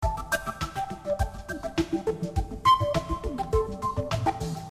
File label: Bottles 100 Am Revb